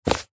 household book thick hard back cookery touch grab; zapsplat

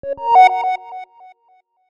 app alert tone

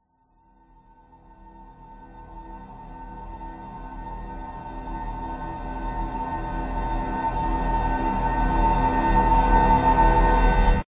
horror riser reversed piano dark eerie

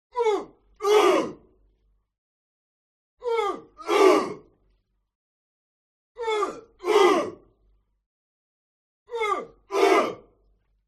HUMAN CROWD Military Shout Uh; smartsound

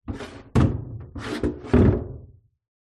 household plastic bucket movement in metal sink

